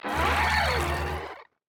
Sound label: Roar; JumbleGuts; Waning